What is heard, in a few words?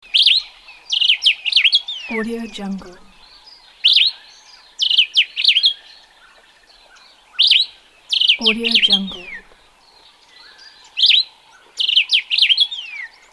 african songbird